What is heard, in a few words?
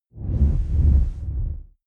noise buzz